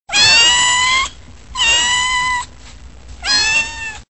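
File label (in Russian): жалобный крик о помощи раненого кота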